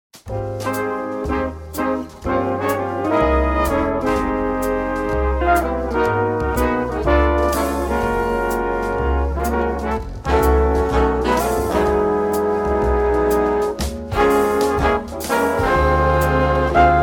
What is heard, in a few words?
Easy Swing Trumpet